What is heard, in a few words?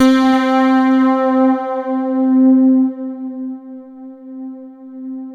DANCE SYNT; E-MU Formula 4000 Series Vol. 2